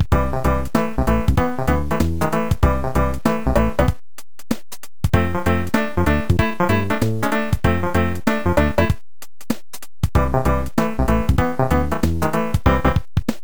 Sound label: menu; smw; smw1.7